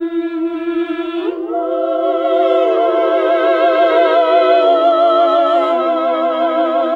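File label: Classical Sing, choir; Best Service Gigapack 1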